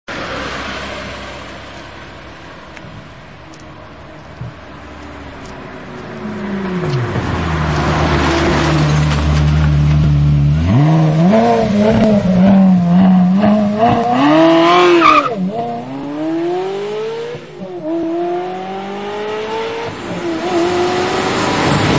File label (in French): Ferrari 360 arrivee demarrage